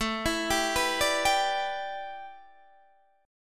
A 9th Suspended 4th Guitar Open D, chord

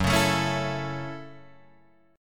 F sharp Minor 7th F sharp, Guitar Open D

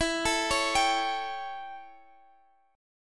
A 7th E, chord

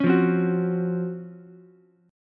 Db Major 11th Db Ukulele C6; 6,5,8,9; strummed; chord shape; JGuitar

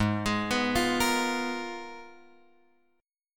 G sharp Minor 6th Add 9th; G#m6add9 Chord {4 X 6 4 6 6} arpeggiated

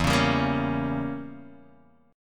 E Diminished 7th E, Guitar Drop D

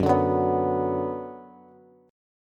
Gb Suspended 4th Sharp 5th, Bass; strummed; JGuitar

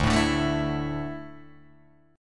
D Suspended 2nd D down Guitar DADGAD

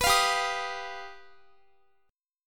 G Augmented 7th, Guitar; strummed

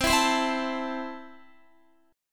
C 6th C down Guitar; strummed

